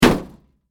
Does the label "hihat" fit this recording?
No